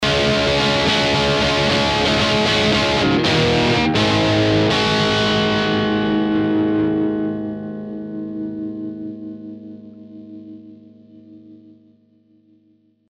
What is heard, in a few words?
guitar drive